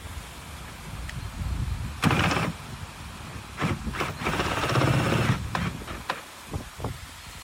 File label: caravan legs set up; oakdown holiday park